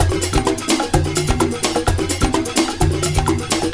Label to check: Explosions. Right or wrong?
Wrong